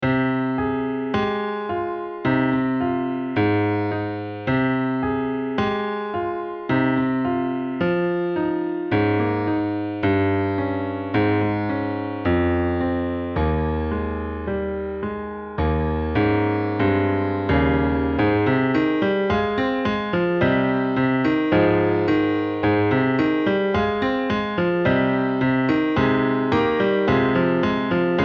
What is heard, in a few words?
Piano; JoyInBeingAble